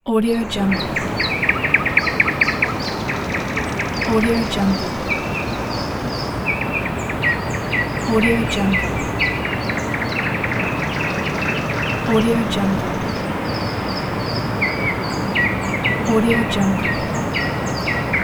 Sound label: ambience over a valley town